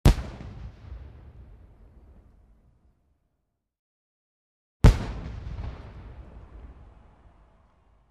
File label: Firecracker explosion with echo; explosions